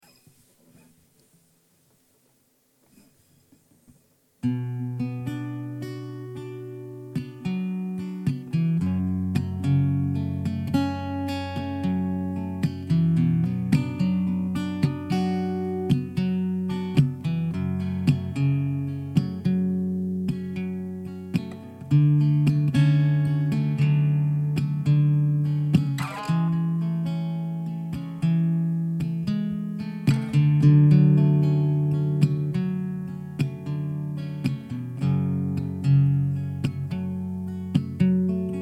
Bm 110 GTR Acoustic Chords

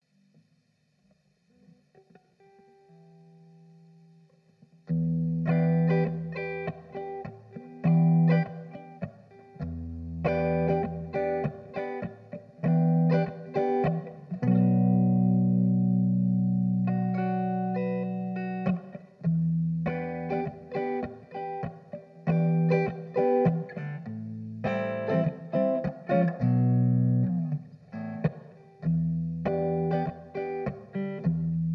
Em 100 GTR Jam

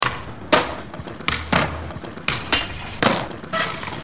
Skate Break

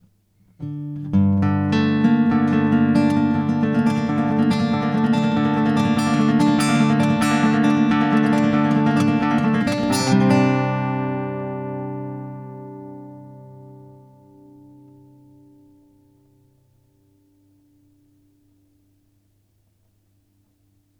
Gm120 GTR flamenco2

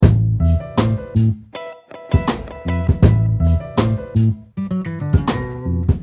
Downloop 80

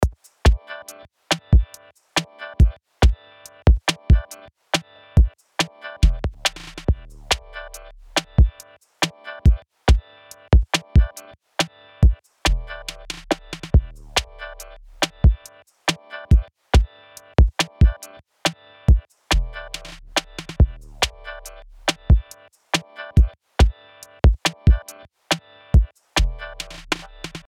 Ordinary Groove

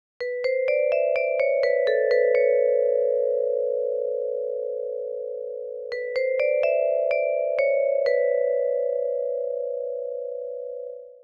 Vibraphone

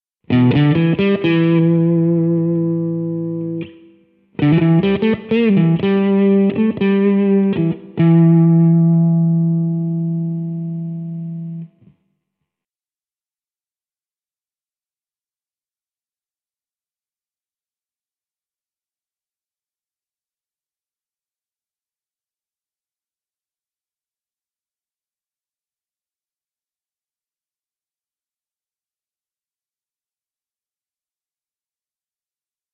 Jazz Blues in Em 85 bpm

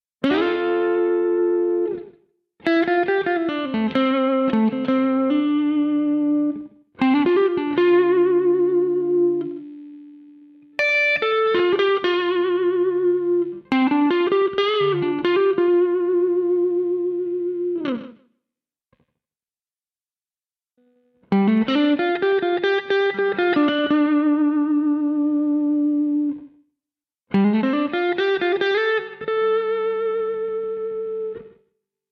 solo

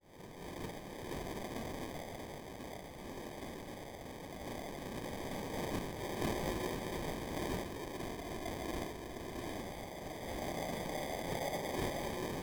noises ambient plane

